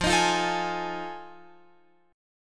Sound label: F 7th Sharp 9th, Guitar, Open F; F7#9 strummed; x,12,15,12,12,15